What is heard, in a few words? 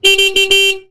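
Car Horn Antique Variety, old car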